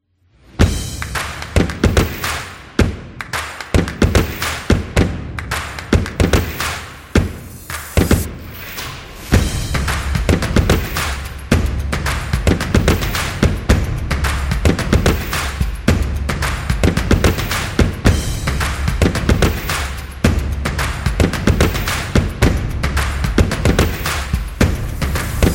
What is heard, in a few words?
Percussive; energetic percussion